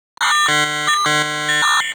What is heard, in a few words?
Hack Minigame Start; Entrati